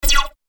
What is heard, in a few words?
laser